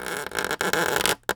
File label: Polystyrene Creak